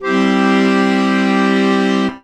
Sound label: AKORDE C DUR; ACCORD.CHRDS